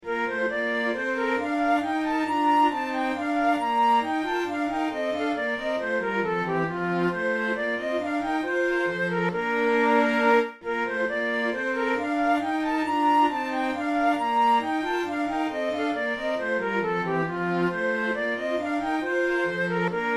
flute

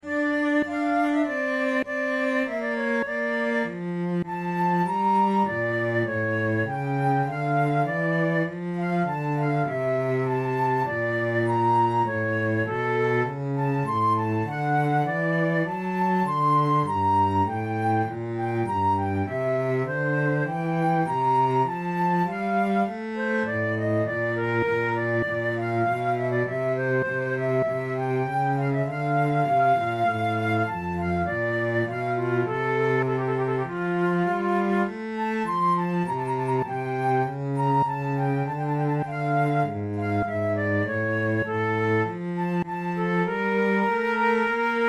flute sonatas adagio; locatelli

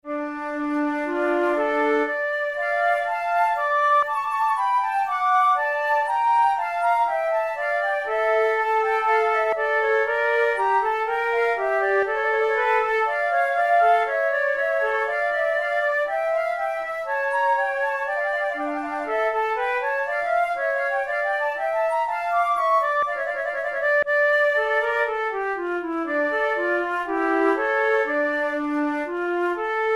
prelude, flute

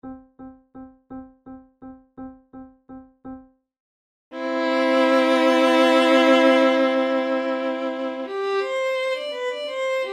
musette, flute tunes; naudot; les plaisirs de champigny iii

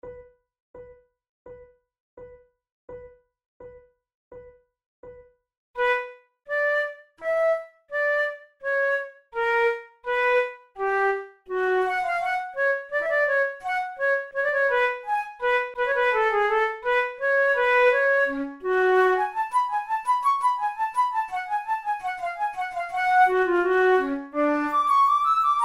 in b minor, flute

